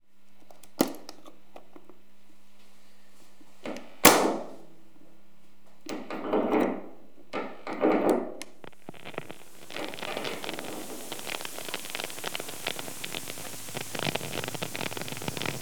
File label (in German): Zündung Lichtbogen prasselnder Sender Empfänger; Funkerberg